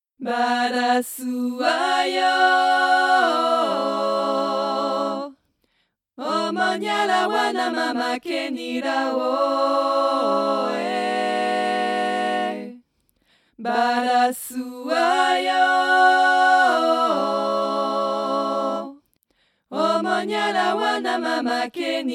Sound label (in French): a 4 voix